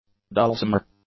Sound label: dulcimer